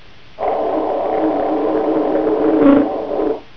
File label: tuba